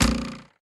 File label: Crossbow Fire